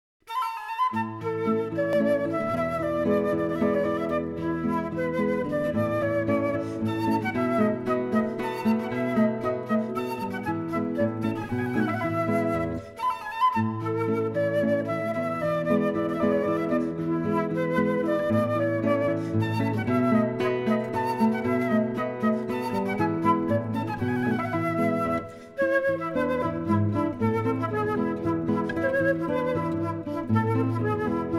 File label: Flute; celebrated minuet; Classical